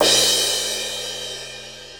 18 inch medium thin crash crash, cymbal; Zildjian

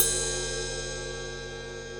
22 inch ride ping, cymbal; apx; Sabian

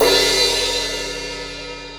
18 inch medium crash, cymbal; b8 pro; Sabian

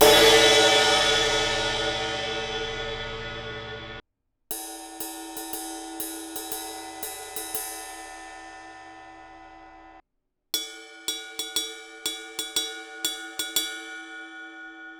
21 inch ride crash ride bell; a custom rezo; cymbal; Zildjian

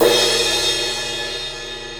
18 inch crash crash, cymbal; a custom rezo; Zildjian

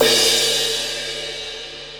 18 inch crash crash, cymbal; universal; Supernatural

